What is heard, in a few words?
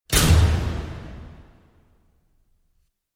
slam